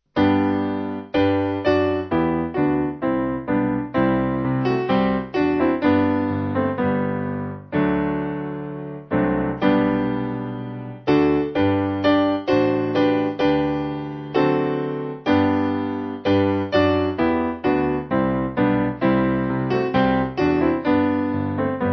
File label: Lo He Comes, Piano